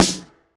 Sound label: normal hit clap